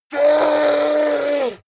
yeti attack shout aggressive painful; schreie

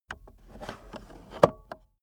car accessories cup holder push in slow

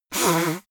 nose blow out; koerpergeraeusche; menschen; soundpool

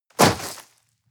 step gravel debris, footsteps; schritte; menschen

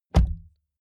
car bmw e46 interior door front close; Cars

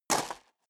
step sneaker gravel medium single right; schritte

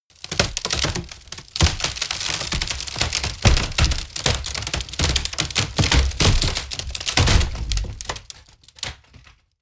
wood break large; berufe; foerster

